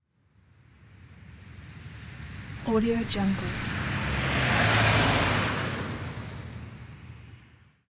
passing car

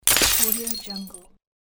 glass break

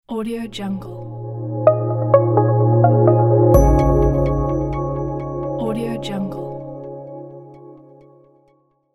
melodic logo

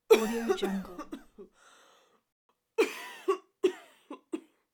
adult female cough

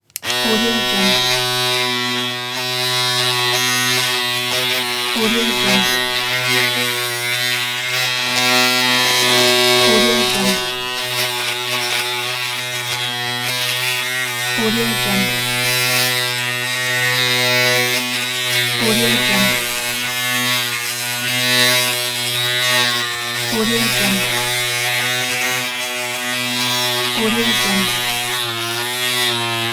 electric shaver

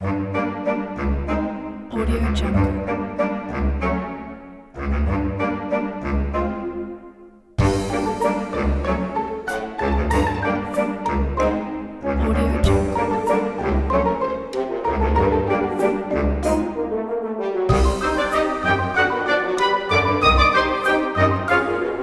funny

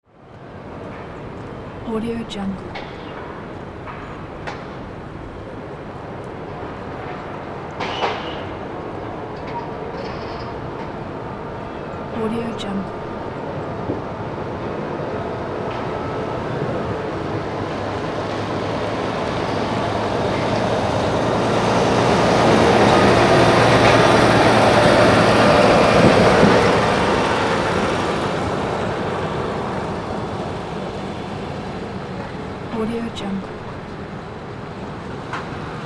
seaside city ambience

